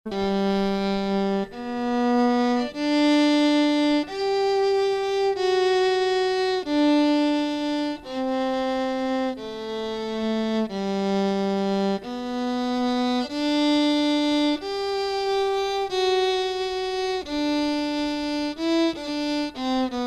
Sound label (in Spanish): Base ritmos